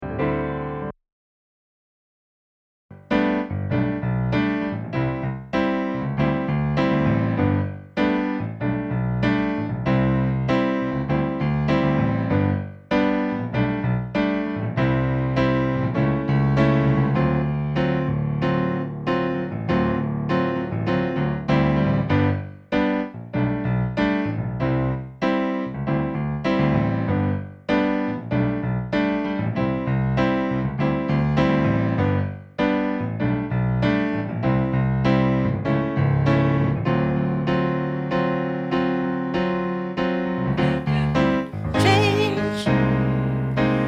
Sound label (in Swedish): sopran